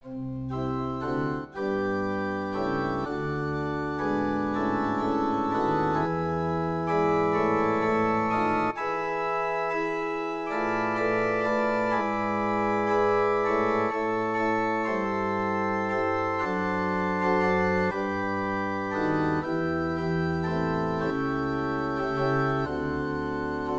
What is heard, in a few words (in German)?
Orgel impro